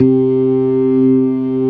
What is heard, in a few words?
TARK, organ combos